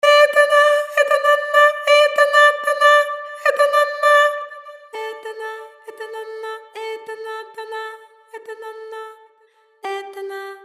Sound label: Vocalise Rhythmic Pedals Staggered